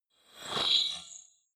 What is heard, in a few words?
flyby splutter